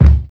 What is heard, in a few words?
sharp kick drum c sharp key